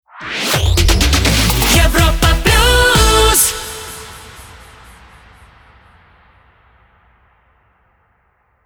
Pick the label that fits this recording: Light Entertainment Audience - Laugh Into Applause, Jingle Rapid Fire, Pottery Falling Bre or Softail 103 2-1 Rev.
Jingle Rapid Fire